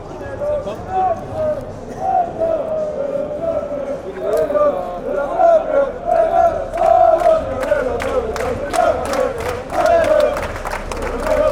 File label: ey oh lokeren, fanchants